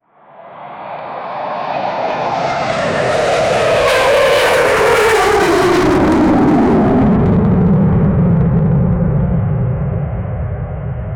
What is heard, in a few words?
sound of a downed jet, aerospace